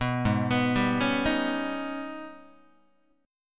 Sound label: Ab Minor Major 11th, Guitar; arpeggiated; JGuitar